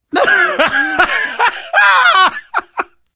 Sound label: laughter, survivor voice; source engine; left 4 dead 2; manager